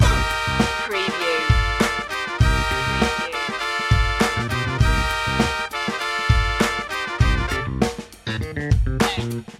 funkin yell loop

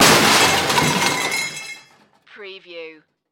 Crash Metal

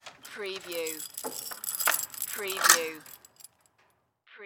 Chain Take Off Wall

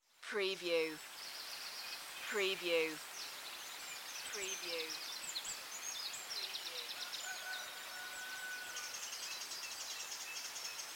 Dawn chorus in Anglesey Wales; beanstalk audio

